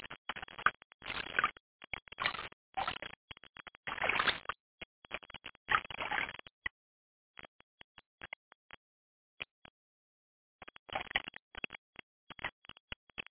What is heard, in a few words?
MELT; Softdisk for Windows 40